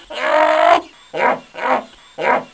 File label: LAUGH